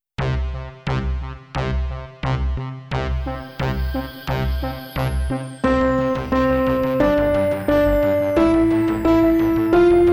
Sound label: Men from Mars Slow